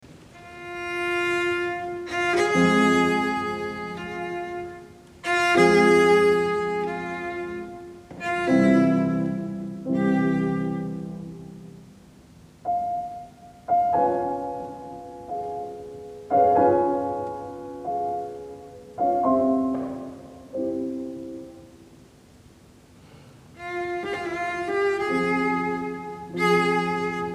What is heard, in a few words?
Horn Sonata in F Major Op. 17 II. Poco Adagio; Beethoven